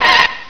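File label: squeak; he kart-race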